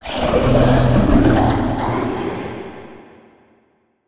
Low Roar Short, Monster Sounds; TheDeclineOfWarriors; Monster Sounds Volume II

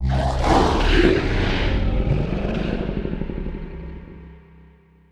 Digital Creature Roar With Tail, Monster Sounds; TheDeclineOfWarriors; Monster Sounds Volume II

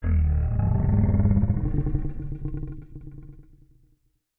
Feline Distant Roar, Monster Sounds; TheDeclineOfWarriors; Monster Sounds Volume II

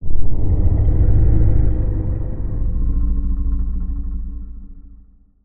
Low Roar Distant, Monster Sounds; The Decline Of Warriors; Monster Sounds Volume II

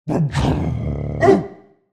Canine Growling Short With Bark, Monster Sounds; TheDeclineOfWarriors; Monster Sounds Volume II

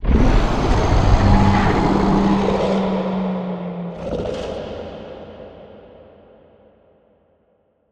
Distant Absctract Scream, Monster Sounds; The Decline Of Warriors; Monster Sounds Volume II

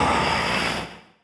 Zombie Agressive; Monster Sounds; TheDeclineOfWarriors; Monster Sounds Volume II

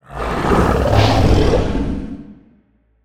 Digital Creature Whooshy Sound, Monster Sounds; TheDeclineOfWarriors; Monster Sounds Volume II

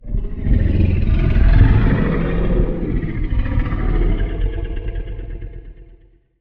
Feline Regular Roar; Monster Sounds; The Decline Of Warriors; Monster Sounds Volume II

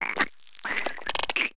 Tiny Creature Undead, Monster Sounds; TheDeclineOfWarriors; Monster Sounds Volume II

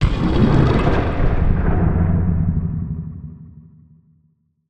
Low Roar Strong, Monster Sounds; The Decline Of Warriors; Monster Sounds Volume II

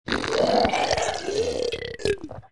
Zombie Disgusting Sounds, Monster Sounds; TheDeclineOfWarriors; Monster Sounds Volume II

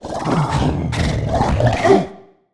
Canine Growling Short With Bark, Monster Sounds; The Decline Of Warriors; Monster Sounds Volume II

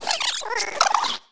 Tiny Creature Playful, Monster Sounds; TheDeclineOfWarriors; Monster Sounds Volume II

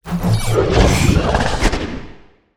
Digital Creature Fast, Monster Sounds; The Decline Of Warriors; Monster Sounds Volume II

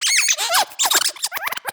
Tiny Creature Stuffed Animal, Monster Sounds; The Decline Of Warriors; Monster Sounds Volume II

